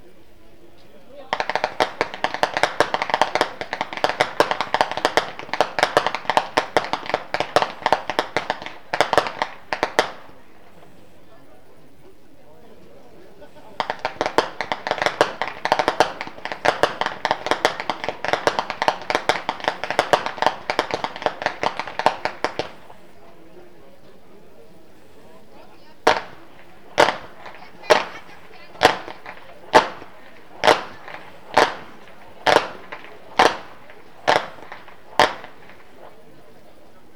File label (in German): schnalzen, flachgau; anthering